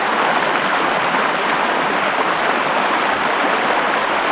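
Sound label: water fast loop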